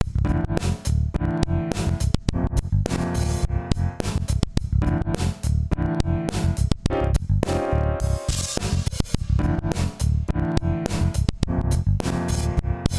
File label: Heritage Audio Successor Limit fast